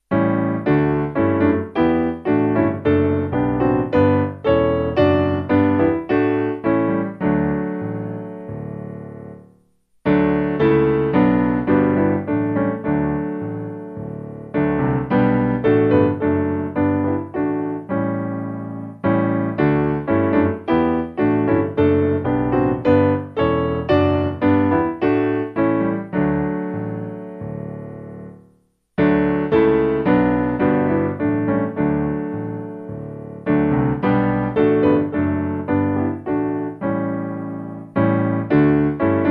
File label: Carlisle Piano; Breathe On Me